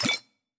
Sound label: Potion Take